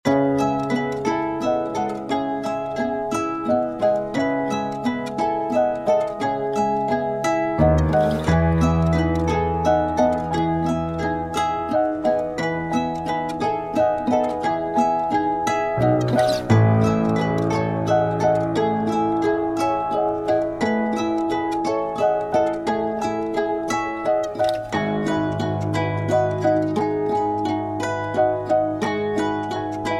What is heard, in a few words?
175bpm 6 over 4 E major